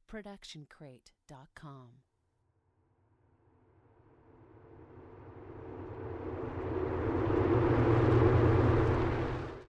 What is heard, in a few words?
distortion wave; SoundsCrate